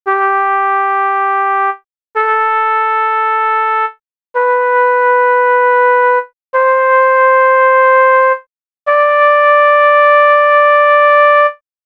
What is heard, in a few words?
trumpet